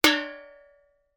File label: impact metal tin small resonate